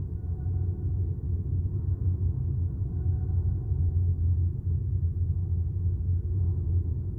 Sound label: wind mine, ambient